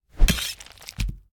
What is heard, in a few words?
beheading; sonniss gdc